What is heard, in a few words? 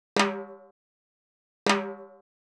Timbali, Latin Percussion